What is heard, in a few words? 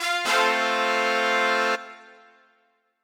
tada